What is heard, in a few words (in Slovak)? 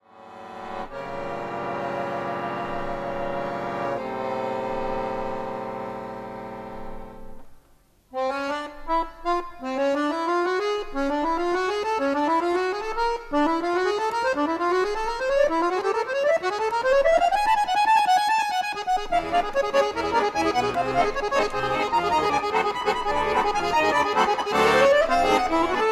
Variacny monolog pre akordeon